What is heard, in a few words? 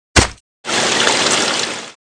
emoticon